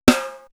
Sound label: Snare, Drum One Shots; AMG - Coldcut's Kleptomania VOL-1